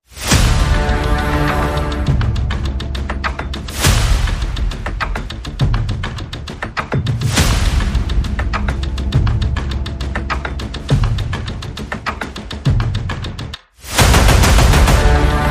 Percussion Synth and Effects

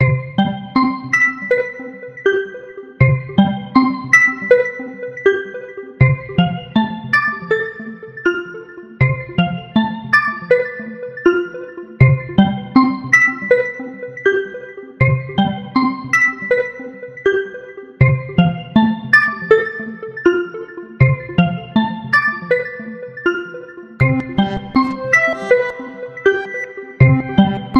Traveling Oddities Pulse

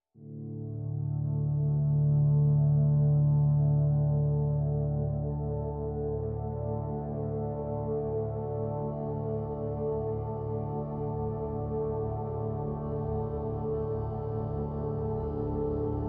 PADS Absolution (D)

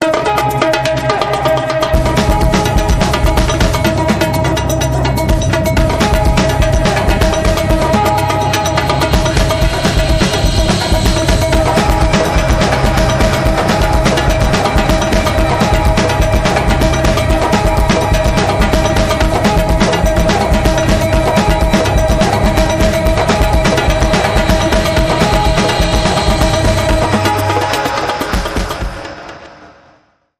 Cuica Brazilica

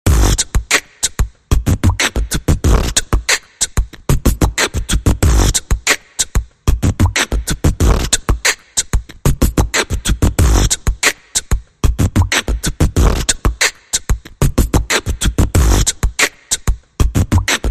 Beatbox Special

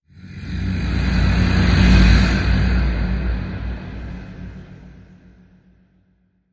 Atomic Whoosh; Godzilla

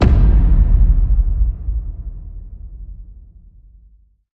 Hit Low

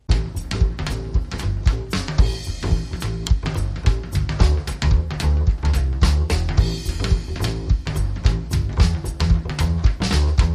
Jive Bass Go Round

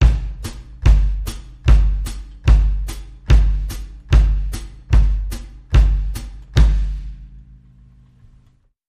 Breaker Action A Drums Only

